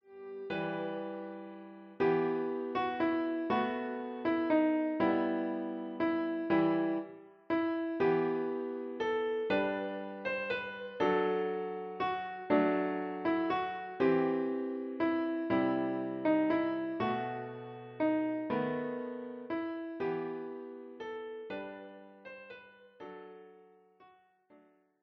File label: What child is this Kl